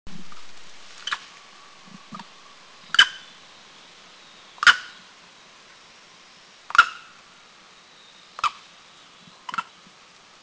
Formosan Macaque Yushan; Taiwan; Formosan Macaque sound recording -Yushan

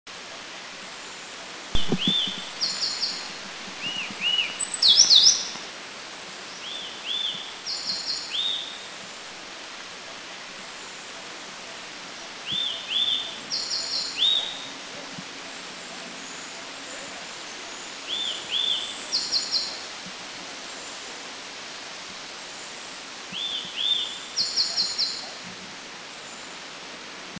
Elegant Tit, Philippines

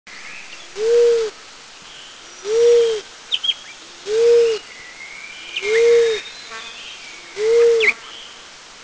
Barred Cuckoo Dove; Mishmi; India

